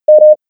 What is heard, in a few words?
warning; nopenpilot; selfdrive